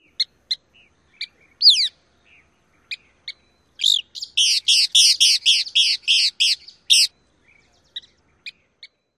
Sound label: calls; TX